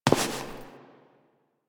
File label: Step; Warframe; Koumei